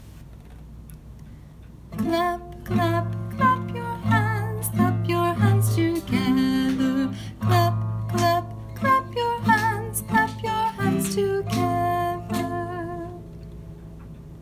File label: Clap Clap Clap Your Hands